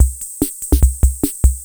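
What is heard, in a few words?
ROCK, drum machine; Crypton - DrumBase5000; TR-66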